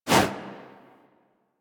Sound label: Generic Weapon Light Aim Transition In; Warframe; Weapons